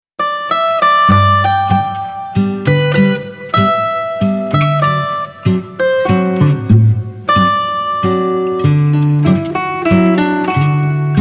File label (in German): Zither Walz